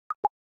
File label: Voice Success; Samsung; GT-S8600 Wave III